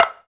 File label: African Perc